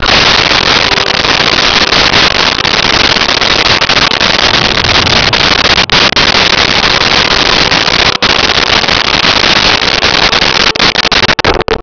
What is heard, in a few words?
chorus full